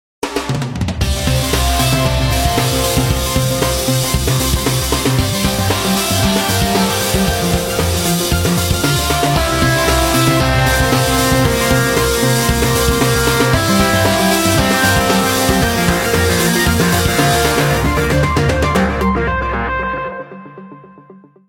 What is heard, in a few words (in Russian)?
originalnye ringtony nokia 5310 xpressmusic